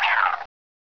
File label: cricket angry; gearbox